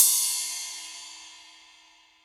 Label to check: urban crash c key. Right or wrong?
Right